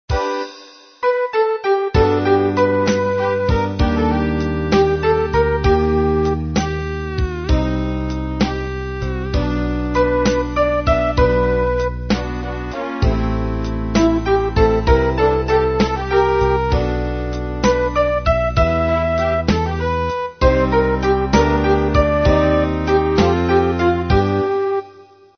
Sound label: midi